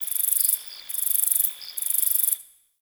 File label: Cricket; Warframe; The New War; Ambience; Zariman